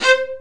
Spicato Basses & Celli; OMI - Universe of Sounds; EII Factory Library

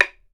BAMBOO, World Assortment

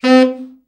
BRA TENOR SN, SAX

